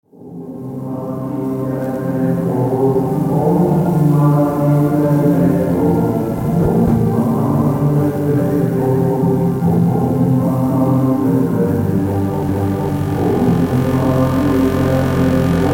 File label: noise machine